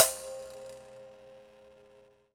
Prepared Drums

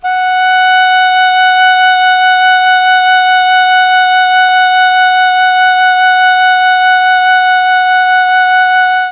F#3 OBOE, Mellotron; CHMBLN OBOE; Mike Pinder - Mellotron Archive CD

